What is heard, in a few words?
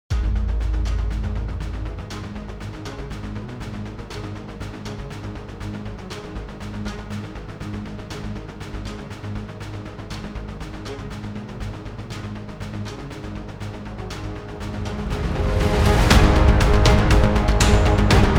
combat